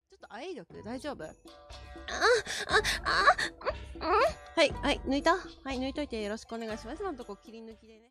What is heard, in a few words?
moan; gundou mirei; eroi